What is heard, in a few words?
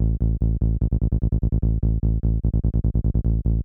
303 Bass